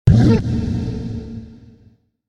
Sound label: Hurt; Satan